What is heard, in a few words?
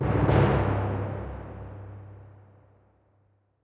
Creep Tymp